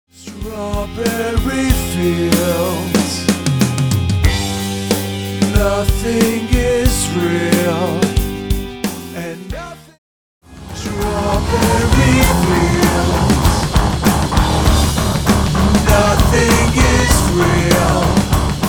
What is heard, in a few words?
Backing Track